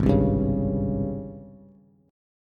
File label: G sharp Major 11th, Bass; strummed; chord; 4,3,5,6